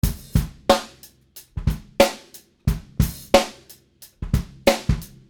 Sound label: Beat